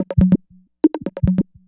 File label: Percussion, Trance NRG, Drums; Sonic Foundry (Sony Creative Software); Trance NRG VOL-2